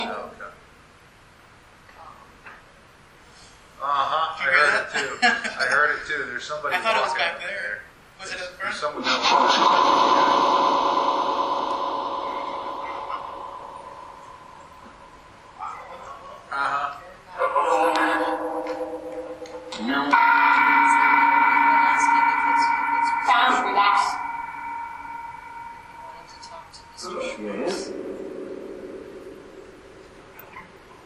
Auditorium Phasma Box

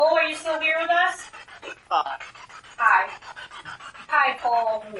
Basement Spirit Box; gill house investigation

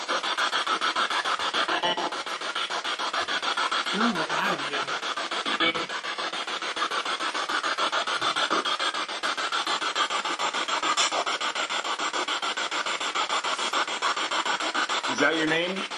Spirit Box; wildwood sanitarium investigation; Basement

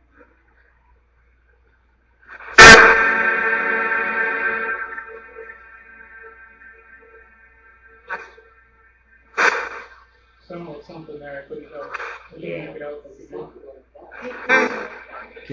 Living Room Portal; historic rainworth lodge investigation

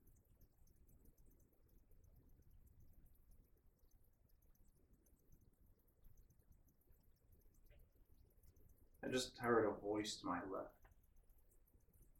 Barber Shop

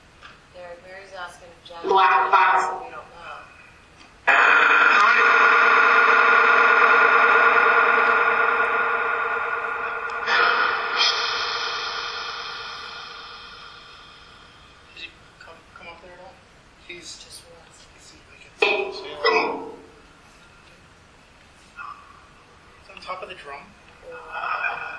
Phasma Box; beaver area high school investigation

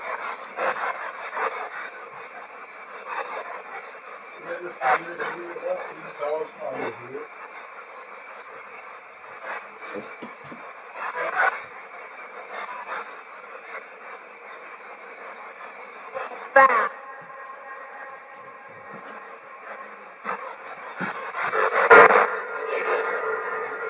Library Spirit Box

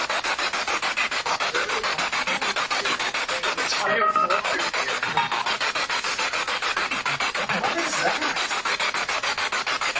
Living Room Spirit Box

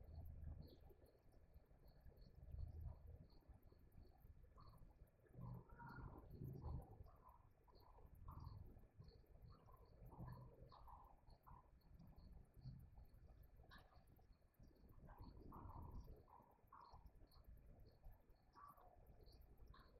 Townsend Room Static; Paired with Grey; irish townsend house investigation

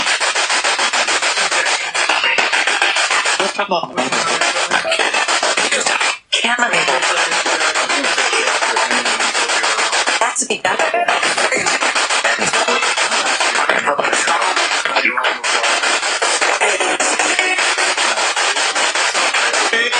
Spirit Box; Attic Small Room

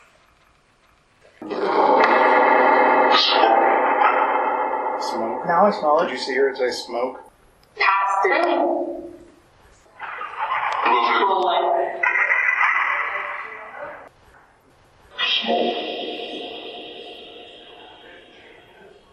Nursery Phasma; Smoke Pass through To the light Smoke; blennerhassett island; case study 4 investigations

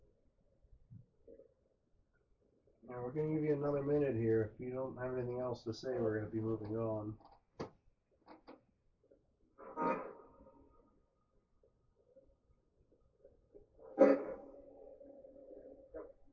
Up Couples Room Spirit Box; wexford general store antique shop return investigation

